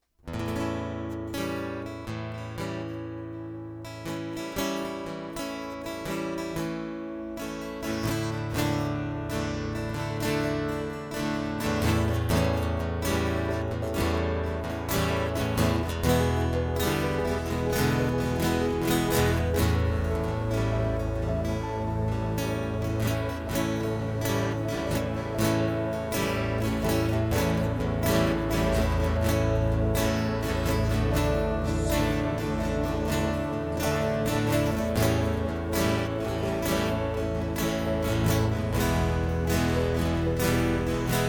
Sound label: Instrumental Intro